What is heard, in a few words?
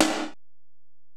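Acoustic Snare, Alesis DM-PRO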